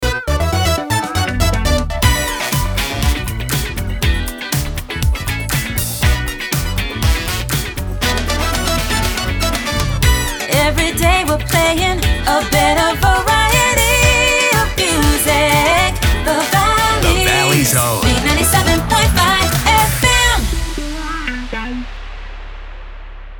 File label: Sung Jingle, B Minor, 120bpm, RAMP